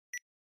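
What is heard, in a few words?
Portable MiniDisc Beep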